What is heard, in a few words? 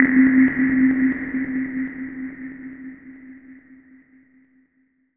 Tonal FX C